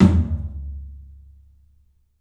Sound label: PA TOM, 14 TOM; 3D Sound; 3D Drums; CLUB 14 TOM